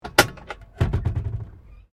CAR HOLDEN FJ GLOVEBOX OPEN, Classic Australian cars